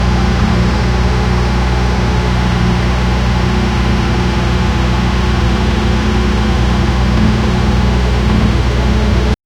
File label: heavy duty tractor engine; agriculture